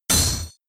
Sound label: Protect shield hit; Pokemon; gen 7; SUMO; USUM